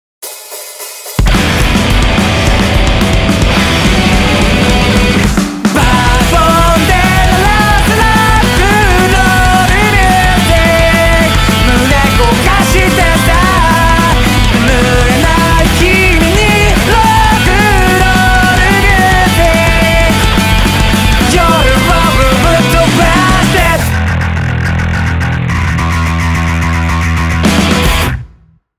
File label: Rock 'n' roll music